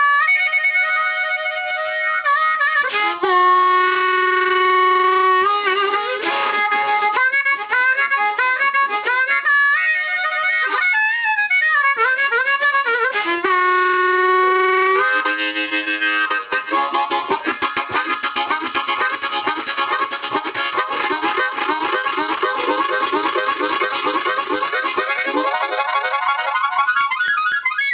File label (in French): HARMONICA, instruments musique